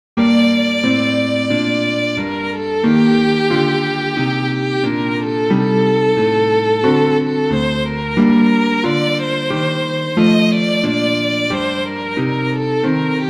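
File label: Handel trio sonata for two violins and bass (clavier); g. f.gendel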